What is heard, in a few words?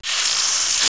Water, 水声; 特酷声音素材库